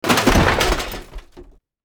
falling

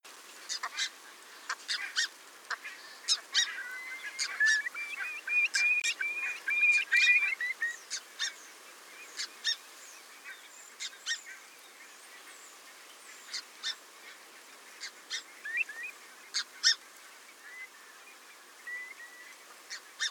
red breasted goose

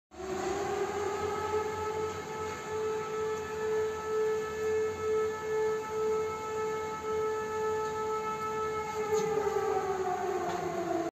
alarm siren in Tehran; آژیر خطر جنگ; تهران

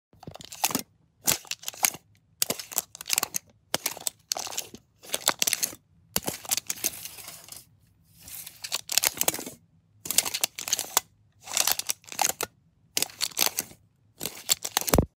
Measuring Tape

